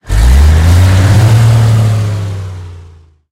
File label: tiger diesel engine load, engines tanks; War Thunder